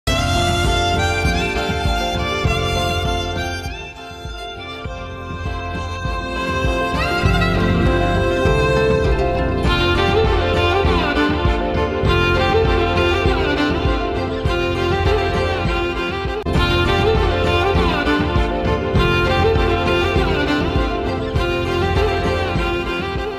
BGM ringtone

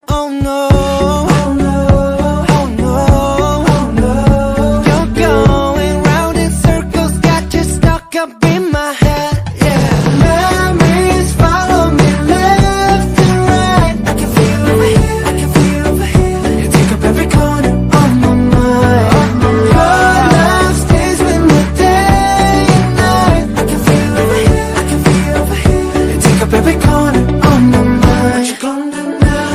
english ringtone

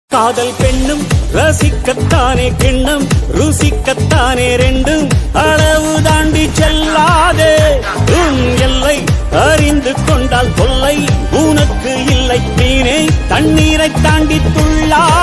tamil ringtone

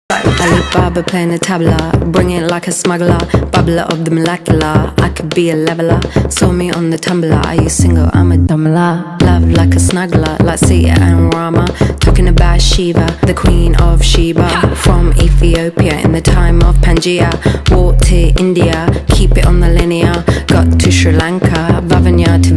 tamil ringtone

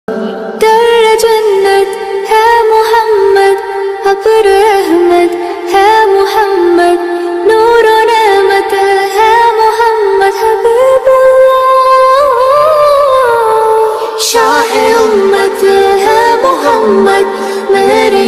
slowed arabic ringtone

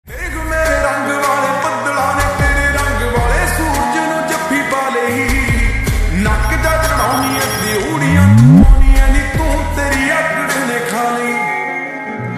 slowed reverb punjabi ringtone

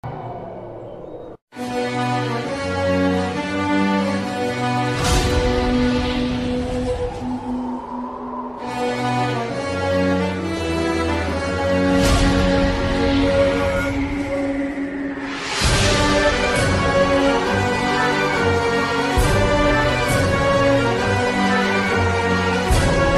instrumental ringtone, devotional ringtone; Mahabharata

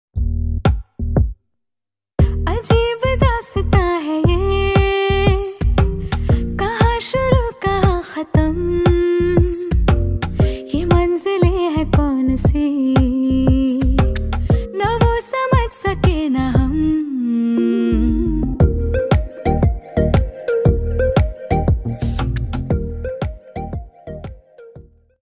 ringtone

